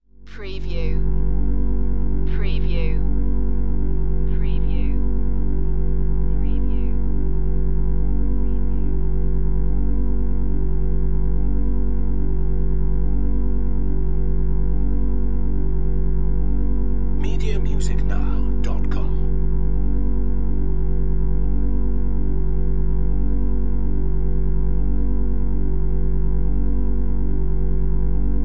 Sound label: Electrical Hum Drone; Sound Effects Bible